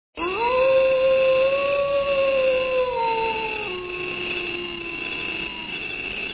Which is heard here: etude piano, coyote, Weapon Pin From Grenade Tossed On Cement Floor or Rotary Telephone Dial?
coyote